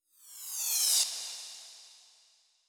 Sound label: Cymbals Reverse Crash; Vengeance Samples